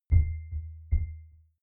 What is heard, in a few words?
button deep drum chink; maschinen; signale